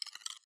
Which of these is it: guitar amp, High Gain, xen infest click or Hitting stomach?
xen infest click